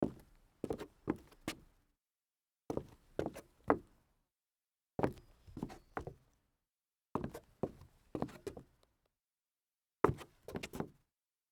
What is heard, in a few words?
dress shoe turn wood